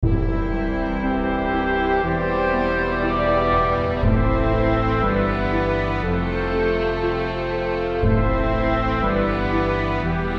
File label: Background, podcast music